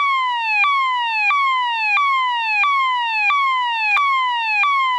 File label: tone emergency